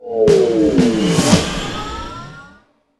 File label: Common Level Up; Inotia 3